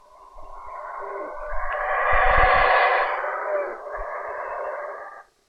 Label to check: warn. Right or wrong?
Wrong